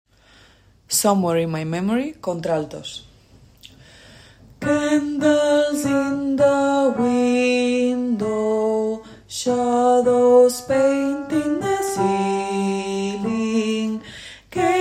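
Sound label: alto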